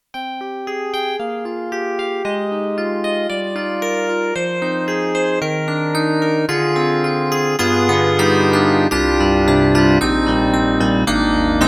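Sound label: YAMAHA MU1000EX, Piercing DX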